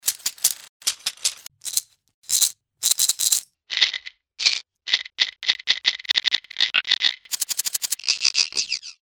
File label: shaker